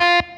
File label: solo Staccato